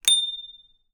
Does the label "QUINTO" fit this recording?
No